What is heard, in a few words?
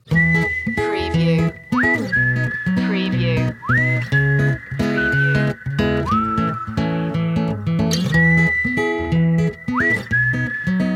happy acoustic waltz